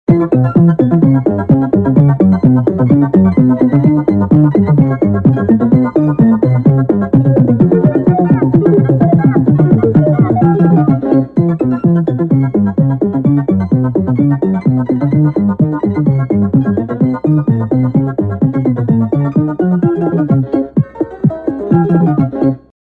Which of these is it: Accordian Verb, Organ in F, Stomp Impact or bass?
bass